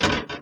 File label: Drain